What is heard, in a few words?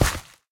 gravel, steps; mc gravel; the monster plane; kolmods